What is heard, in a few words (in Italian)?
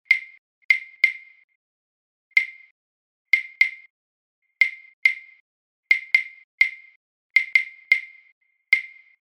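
esercizio pulsazioni irregolari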